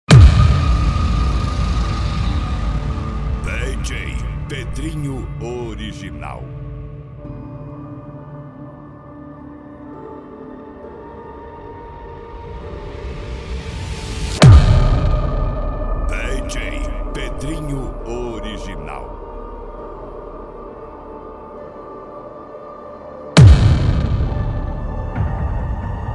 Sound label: reggae gospel